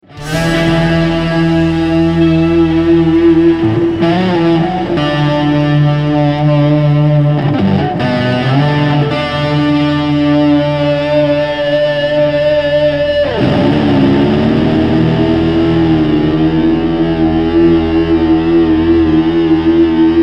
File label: Outro Rams Head Big Muff, inner ear monitor source; EHX "Ram's Head" Big Muff; The Forum; Inner ear monitor mix 2016